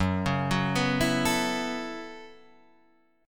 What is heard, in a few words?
Gb Minor 11th Gb Guitar Open D; down; chord shape